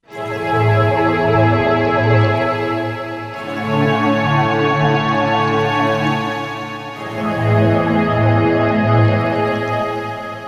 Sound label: WaterSTR; Environment